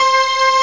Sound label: PIANO xfade; PT Support archive